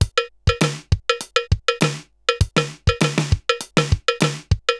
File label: RX17 (100BPM), cyborg beats; musicradar